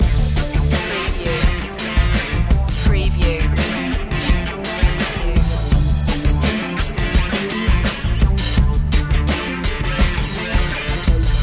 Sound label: astronavigator loop